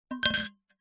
household empty metal cocktail shaker bash against drinking glass; zapsplat